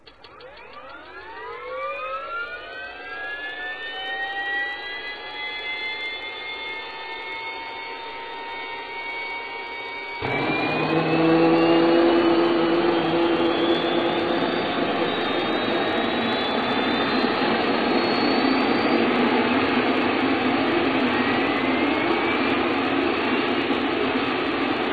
turb startup; svencoop; hc2

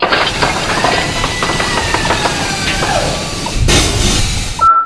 reload; svencoop; frostline

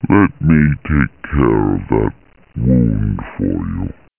alert; svencoop; uboa; ichyhakase

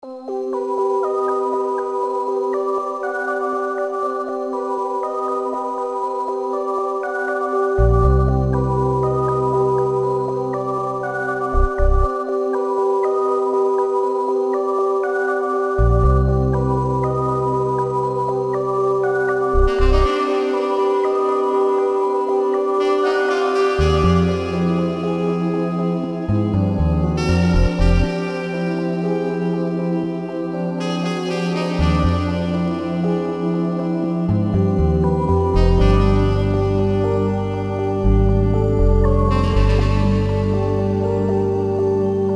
rain, music; svencoop; commandandconquer

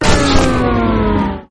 egon off, weapons; svencoop; legends

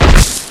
plant; zelda

